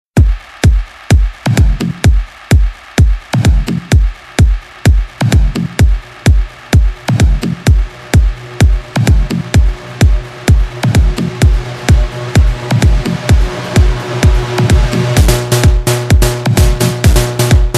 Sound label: Acapella